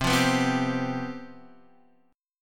C Major 7th Suspended 4th, C, down, Guitar, DADGAD